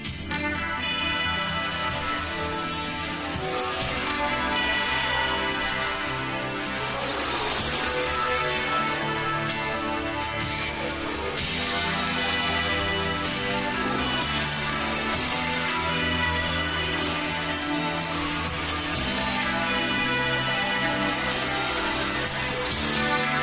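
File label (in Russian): gitara ringtone, guitar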